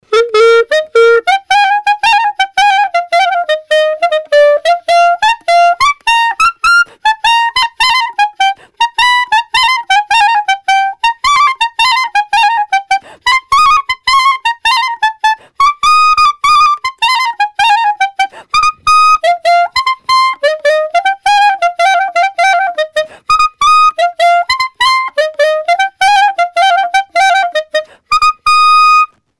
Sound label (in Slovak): eb klarinet